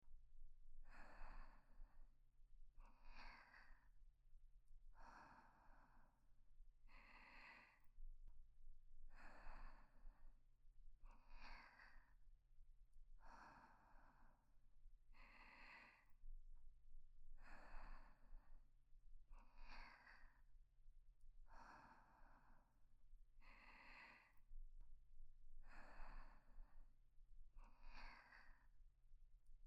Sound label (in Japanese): おやすみなさい～寝息トラック・右Ver～, ASMR